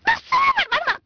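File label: female angry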